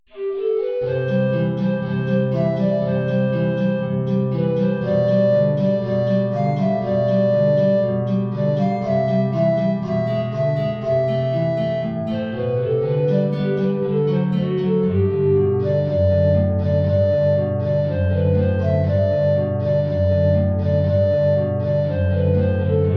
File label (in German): Maestoso Altflöte Gitarre; tiefe Notierung